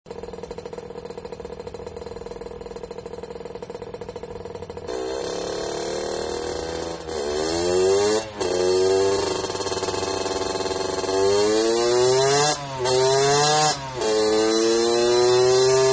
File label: Dirt Bike Idle and Rev; Motorcycles; Vehicles